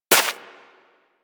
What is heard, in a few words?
Bullet Impact Debris Snow